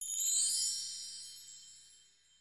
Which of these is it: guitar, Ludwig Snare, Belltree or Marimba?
Belltree